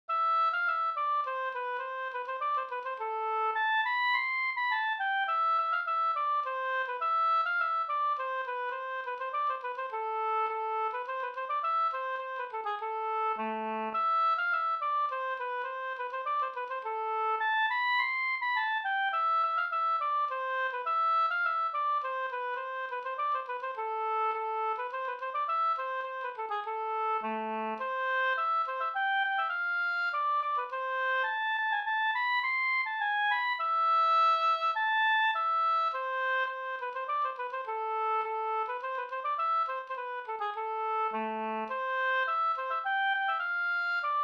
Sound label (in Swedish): slängpolska